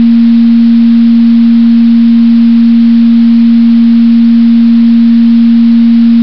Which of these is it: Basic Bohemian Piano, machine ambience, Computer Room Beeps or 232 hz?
232 hz